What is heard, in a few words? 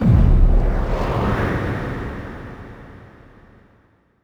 FX Impact; Vengeance Samples